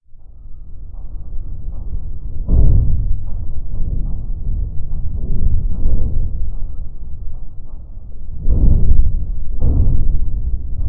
metal low bonk

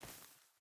footstep barefoot grass walk; Rust

